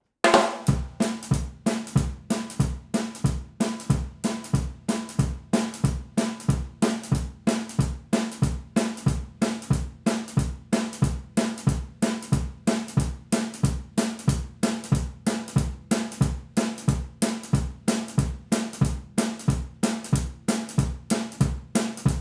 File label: Room Mike Drums